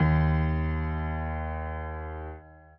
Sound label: chord